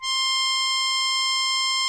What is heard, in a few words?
accordion C5; InVision Interactive; Lightware VOL-1; Instruments & Percussions